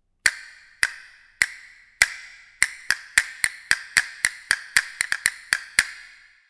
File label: Castanets